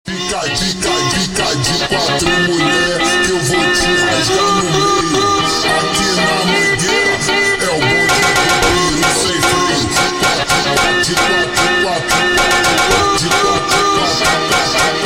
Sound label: Funk super Slowed